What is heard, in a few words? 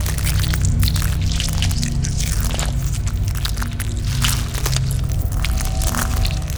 kaiju egg ambience loop; biogenesis